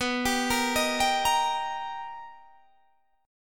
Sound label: B Augmented Major 7th B Guitar DADGAD, chord